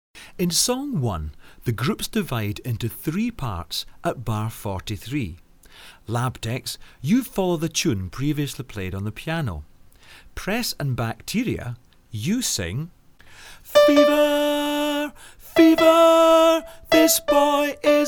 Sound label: song harmonies